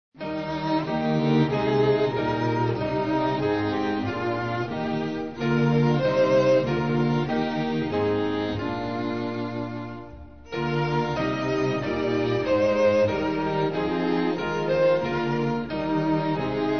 from homes of saints glad songs arise instrumental; hymns french